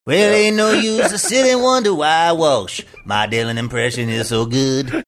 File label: singing saying something